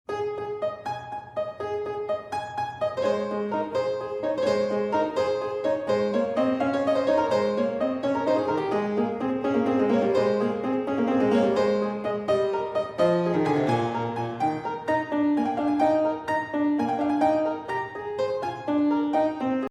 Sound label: Scarlatti early piano